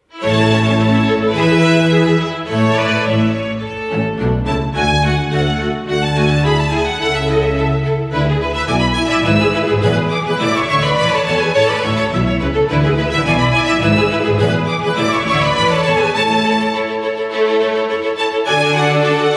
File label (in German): Gitarrenkonzert; Chitarra Concertante; Lhoyer